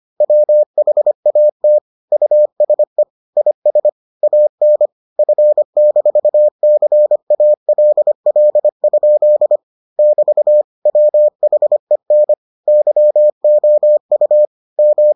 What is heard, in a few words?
morse